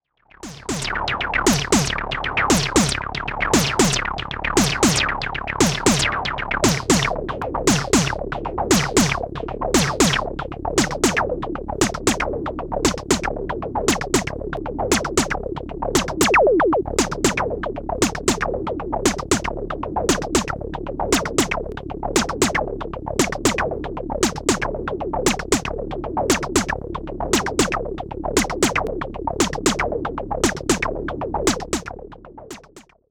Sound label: Moog Sound Studio Patch Juicy